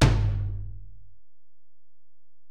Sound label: Tom, Alesis DM-PRO